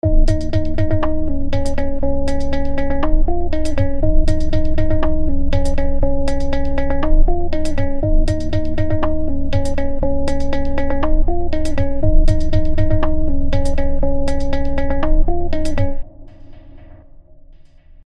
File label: Motion Rhythmer; ShaperBox 2